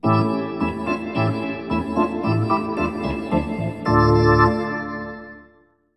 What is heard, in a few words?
Hockey Organ